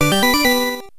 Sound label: Coin; ringtones; Notifications; Darius